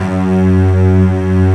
STRINGS F#1; E-MU Formula 4000 Series Vol. 3; Analog Odyssey; Synth Builder

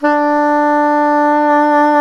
SAX SOP SAX, Soprano Long

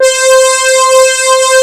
XP SYNTHS; Pro-Rec - Synthsonic; JV ANALOG

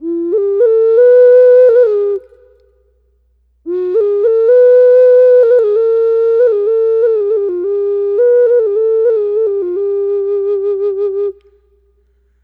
Gourd Occarina; Ambient; Clean Flutes

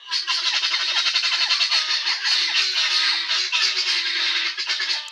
Birds Various, Birds

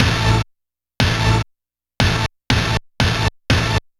8bit Hit; Sonic Foundry (Sony Creative Software); Dr. Fink's Funk Factory; Orchestral Hits

